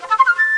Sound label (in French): succes Son